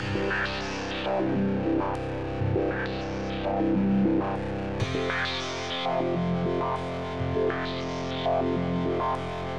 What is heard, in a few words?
synth; tryThis